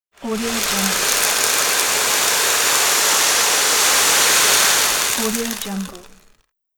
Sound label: seed slide long; electronplanet